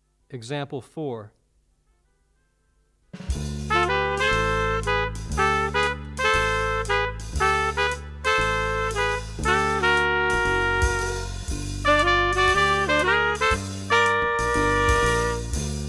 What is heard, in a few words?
two horn audio examples